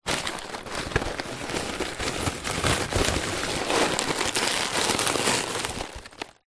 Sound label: food popcorn paper bag full closed movement